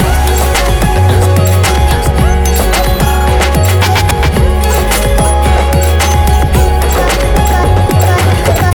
Kicky Pop; Sony; Xperia Movie Creator Music

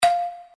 dial tone marimbas; OnePlus One China

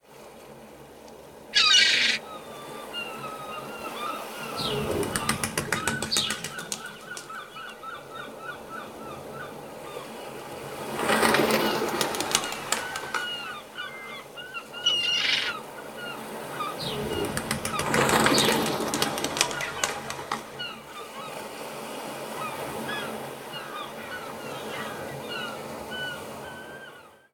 3D Sea Birds, ringtones; SKY IM-A770K-VEGA-Racer

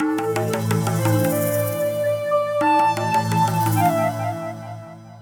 ringtone, newage; Google; NewWave Labs

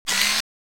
Camcorder Start; SKY; IM-U680L; System Sounds; Camera; Video

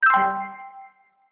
message alert

